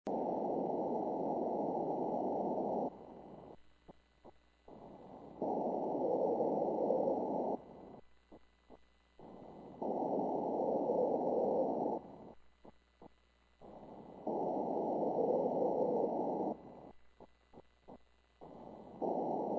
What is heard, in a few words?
10GHz echo; JA4BLC